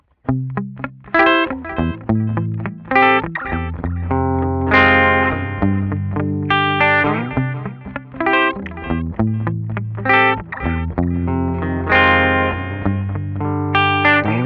Guild Starfire III, Rockabilly; Westerly-made; Guild Starfire III from 1997

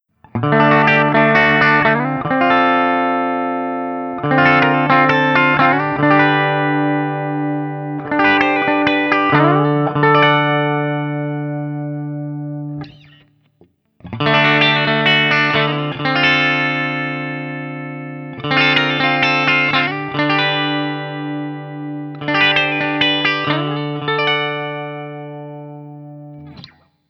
Guild S65D ODS100 D

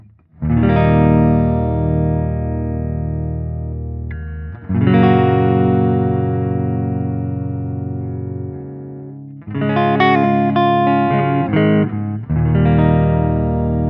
Crossroads Doubleneck 6 ODS100 Open, Open Chords; 6-string; Guild Slash Crossroads Doubleneck; tuned the 6-string down similarly

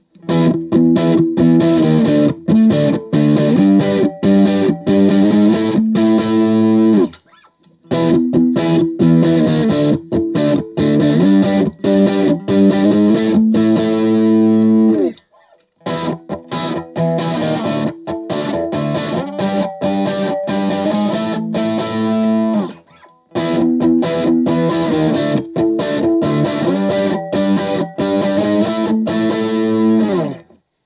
Guild M80 CS JCM800 Chords, guitar

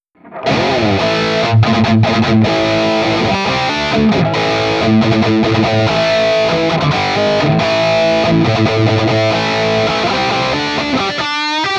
Guild Burnside Crossbow Recto Red; Burnside (by Guild) Crossbow; Recto Red – BK

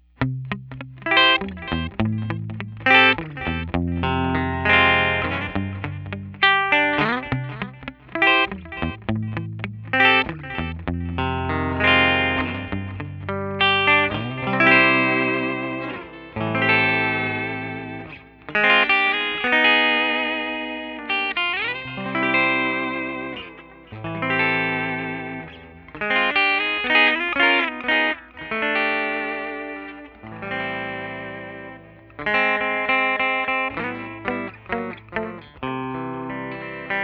Guild X160R Rockabilly Bridge; guitar

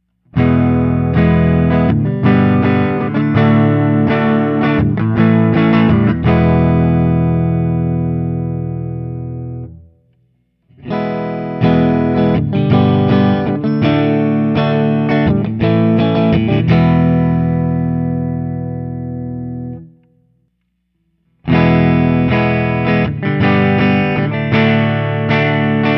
Guild X350 Stratford ODS100; Guild NS X350 Stratford

